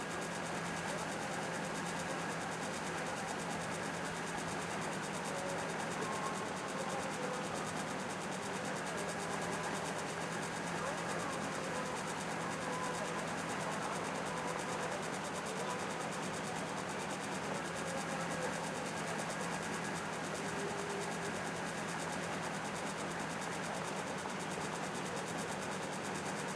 wild Spin Ambience; wild bazaar

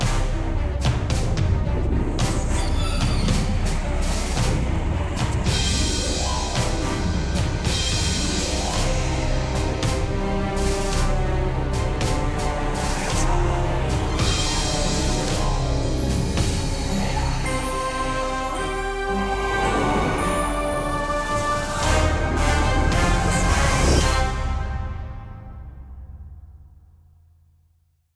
free Spin Intro Movie; dracula

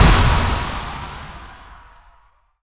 big Win Start; twin spin mw

